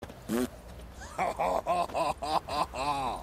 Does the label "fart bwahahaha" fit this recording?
Yes